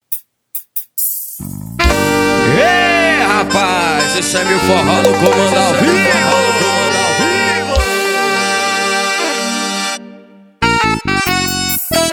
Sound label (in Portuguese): forro passagem de som